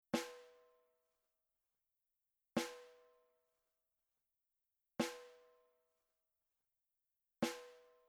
SNARE Etched Edge Soft

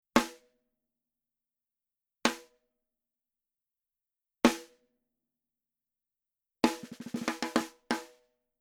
SNARE Rim Shot; Onyx

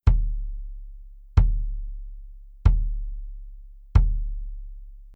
BASS Soft Felt

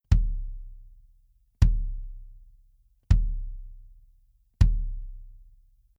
bass soft felt

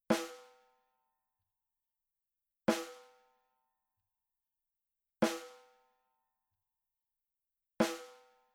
SNARE Power Center Edge Soft

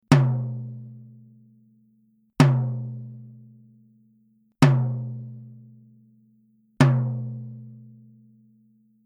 TOM G2Coated Hard

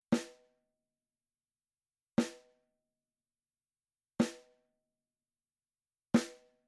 SNARE Edge Soft; Onyx

